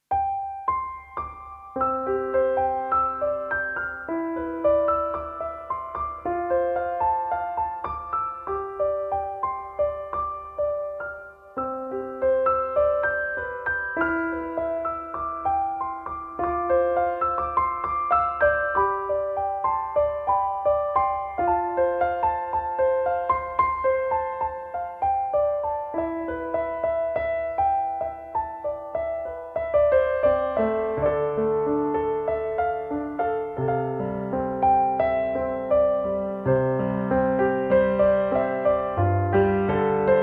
Piano

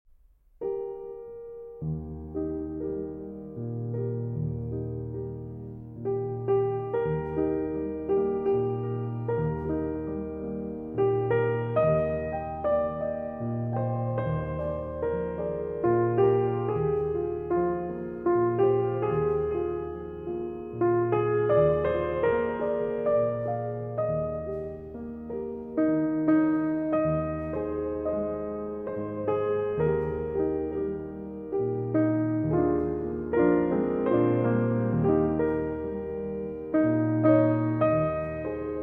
Wiegenlied, Solo Piano

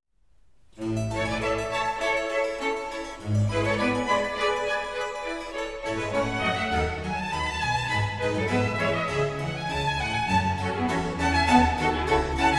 Harpsichord Concerto No. 4 in A major Allegro; (or for oboe d'amore) BWV 1055

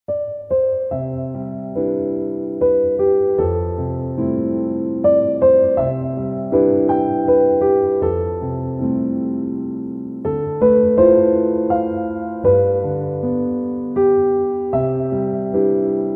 Piano Version

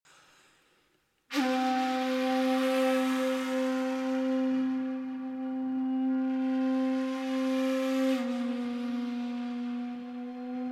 Shakuhachi

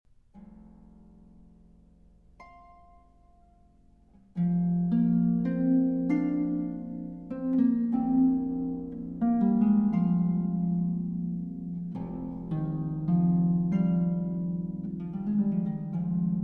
Suite For Harp, Op. 270 VII. Andante; Hovhaness

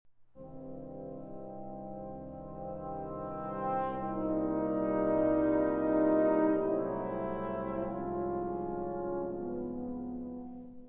Symphony in C Minor, Andante – Allegro

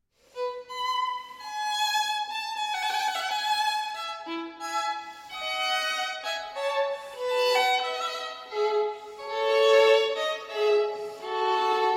Violin Partita in E Major Loure; No. 3; BWV 1006 II.

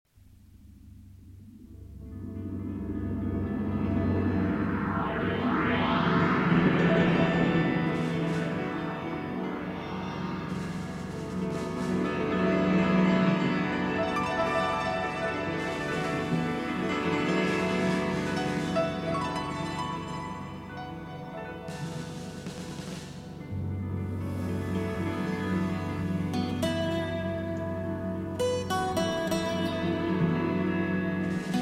Instrumental

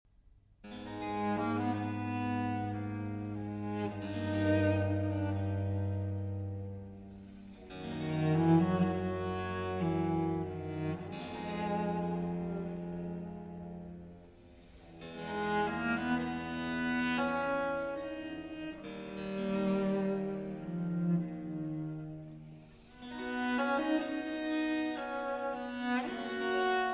Sonata For Cello and Continuo in A Minor; Vivaldi; R.43

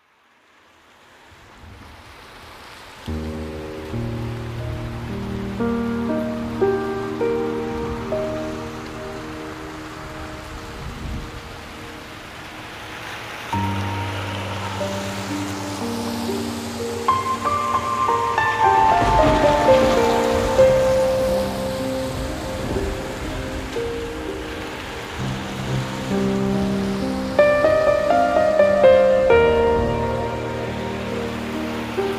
Piano and Rain

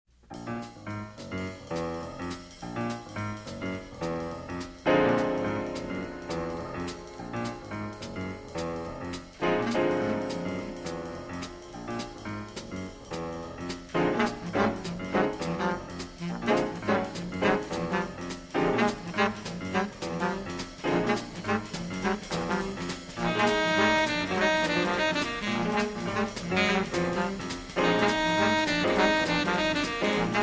Pedal Point Blues

